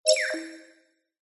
science fiction computer tone interface positive successful beep complex